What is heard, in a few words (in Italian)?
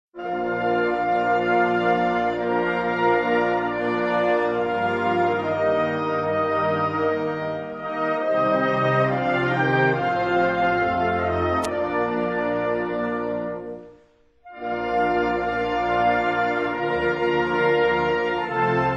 Inno Nazionale Lussemburghese; J A ZINNEN